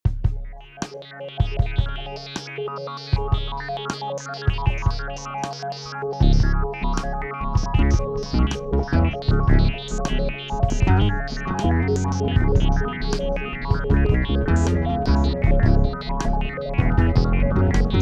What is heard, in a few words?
bass, A Mutron-style patch